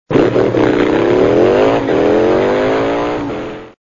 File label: engine sound